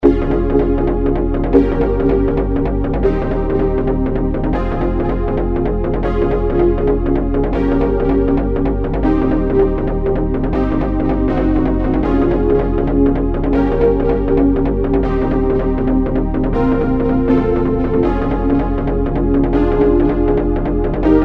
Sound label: bass